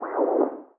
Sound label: Player UI, sfx; Five Nights At Akers; soma